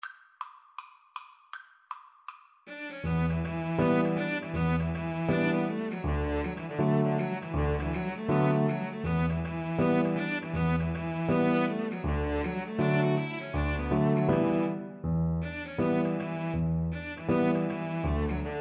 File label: Piano Trio